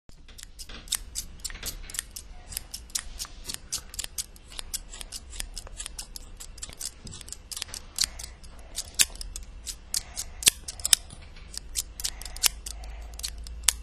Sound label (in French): ciseaux